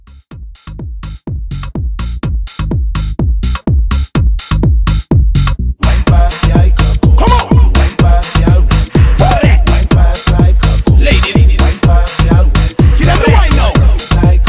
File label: Latin Hype